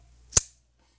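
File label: Spring Assisted Knife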